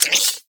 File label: Multi Gun Overcharged Fire; Enemies; Abilities; Warframe; Corpus